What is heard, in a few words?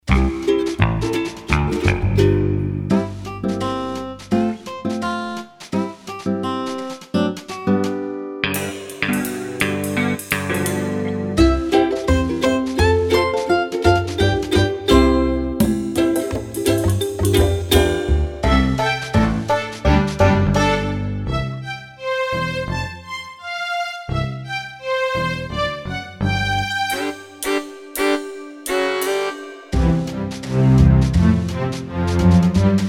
twang those strings backing